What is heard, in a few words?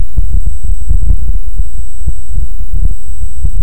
Hydrophone; OneOcean